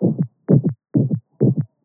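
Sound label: Dog subaortic stenosis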